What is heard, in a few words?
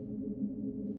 cute drone soft; lower loop